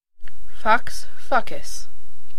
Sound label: fax